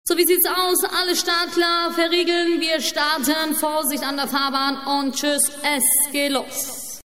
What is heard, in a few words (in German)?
achtbahn